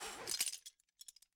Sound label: Rappel End; AdvancedRappelling